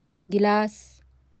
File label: glass